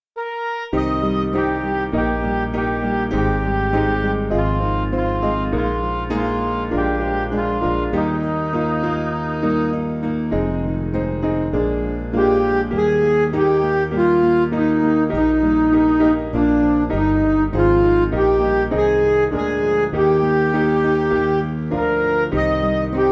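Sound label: Music Backing Files for Churches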